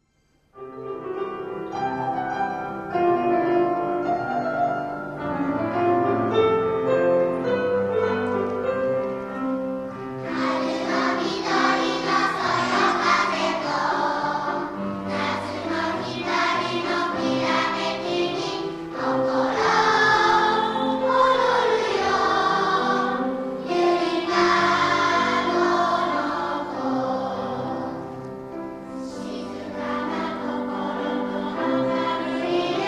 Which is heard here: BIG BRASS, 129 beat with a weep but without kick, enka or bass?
enka